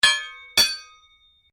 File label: Strike Metal On Metal Heavy Ring Double; Foley sounds for melee weapons; Materials